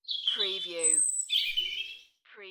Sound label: UK Birds; beanstalk audio